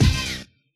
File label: Hit, Yamaha DJX